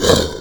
boss lite roar

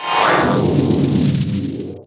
power up

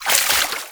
heart in

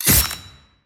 razor blade

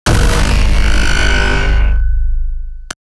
brimstone lazer; dogma

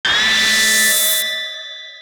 phase 2 light appear; dogma

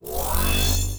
light bolt delayed

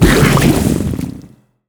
blood laser medium

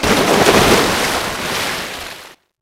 boss dive

